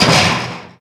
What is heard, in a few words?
facility door open; gesource; doors; facility classic